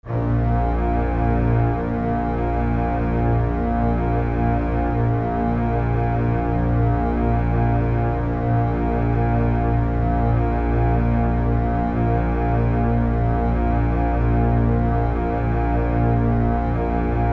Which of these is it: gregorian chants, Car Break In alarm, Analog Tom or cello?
cello